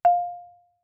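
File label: Marimba cord fortissimo F5; MIS Pitches - 2014; Percussion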